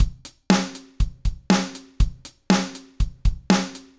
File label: Drums ADD comp